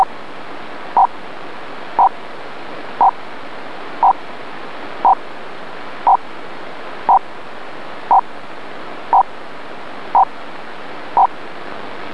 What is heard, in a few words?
pactor; rfi